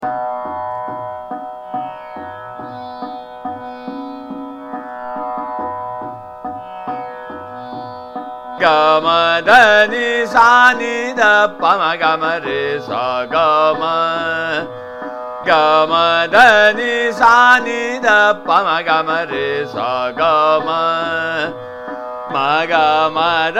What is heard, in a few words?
Sargam, Ga Ma Dha Ni Sa Ni Dha, Trital; Hemant